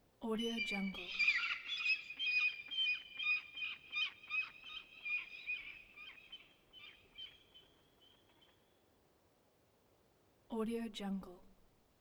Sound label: peregrine falcon away